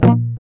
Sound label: A#, Acoustic; Sound & Vision; Gigapack I CD 2; GUI ACOUST.; GUI Acoustic 1